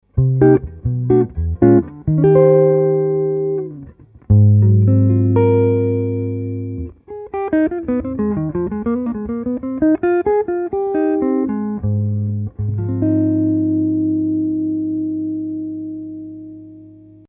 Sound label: jazz